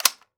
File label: Weapon Gun Rifle M16 Clip Lock; AdobeCC